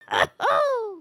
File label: giggle; emotes